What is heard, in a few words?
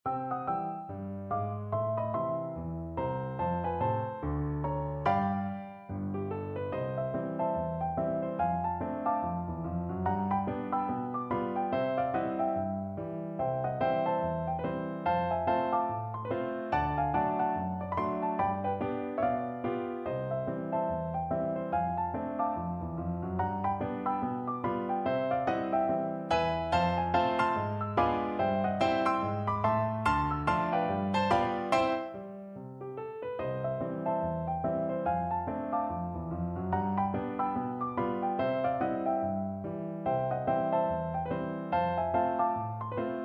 Local Rag; FADE